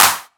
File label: clap sound c key; percussion; single hits one shots